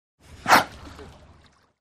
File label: Dolphin Blowhole